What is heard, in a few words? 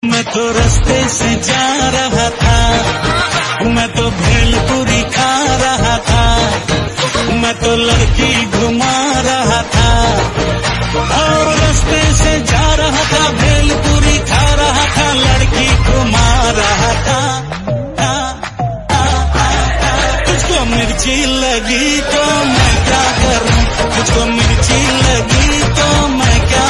Hindi Song Ringtone